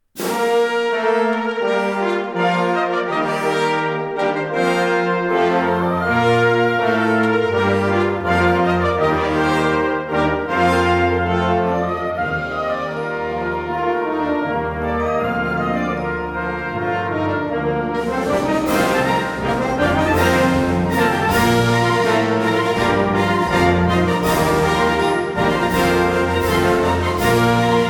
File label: seven short fanfares